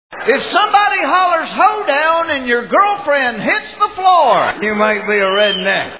redneck hoedown

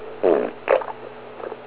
fart

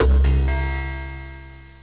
Steely Echo C2

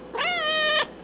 cat shorty

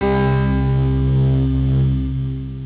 Wood Words C3

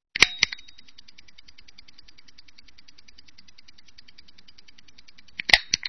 stopwatch

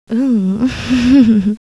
giggle

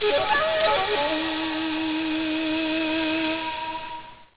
Sledge Flute

